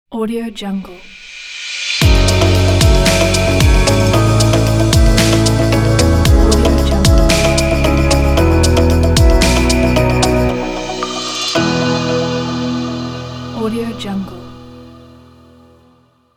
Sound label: atmospheric tech intro opener logo